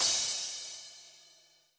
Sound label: soft hit finish